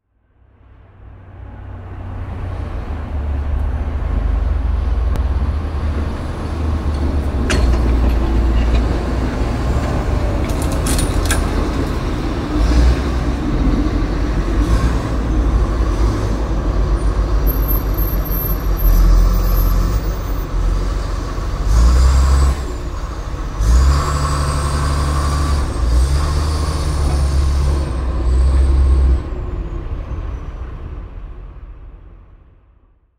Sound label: Snow plow tractor; تراکتور برف روبی